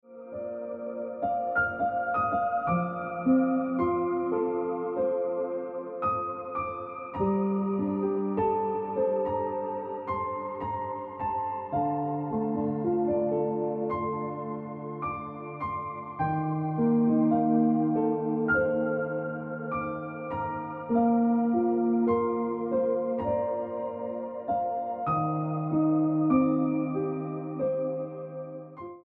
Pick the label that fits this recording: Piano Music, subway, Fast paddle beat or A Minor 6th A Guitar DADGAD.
Piano Music